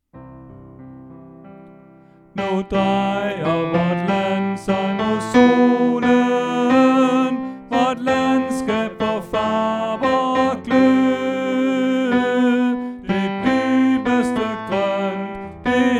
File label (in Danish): Tenor; oevefiler